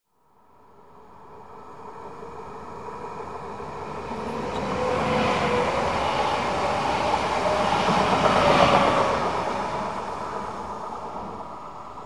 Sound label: passenger train pass by